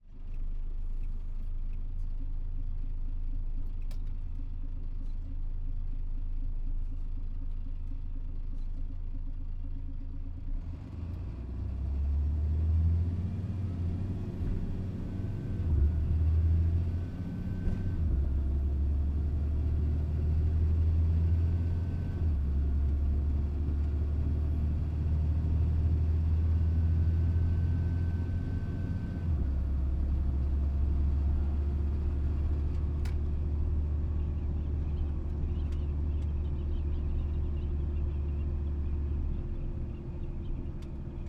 Volvo F12 truck onboard slow accel through gears Long decel Interior; 1992; Pole Position